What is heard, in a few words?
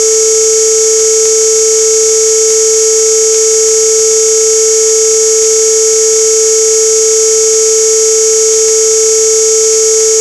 pulse width modulated 440Hz; raspberry pi